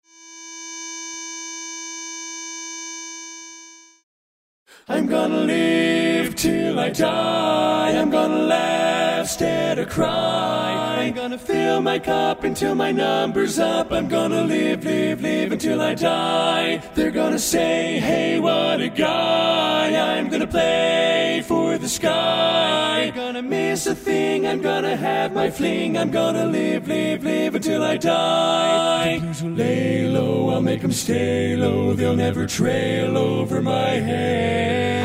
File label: Tenor